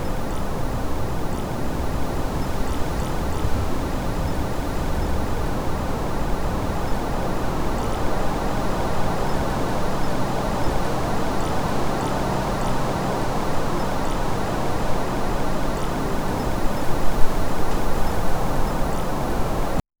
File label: cold night wind brushing